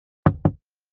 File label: knock; jiti-meet